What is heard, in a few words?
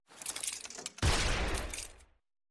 revolver